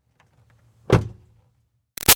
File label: Car Highlander Door Close Lite; 2005 Toyota Highlander V6; Car Sound Effects; Vehicles